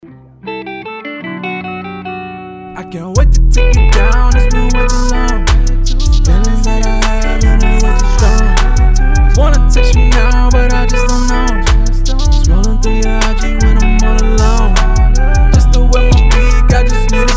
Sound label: autotune hip hop before; mixing